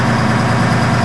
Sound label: Servo